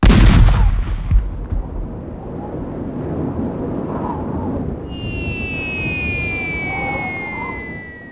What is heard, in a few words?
cannonball stereo